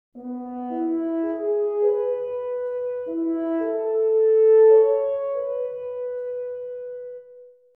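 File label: horn passage mod; expression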